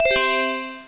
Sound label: close Roll; Fruity